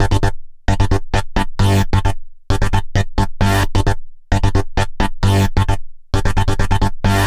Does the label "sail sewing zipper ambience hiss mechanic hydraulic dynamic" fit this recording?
No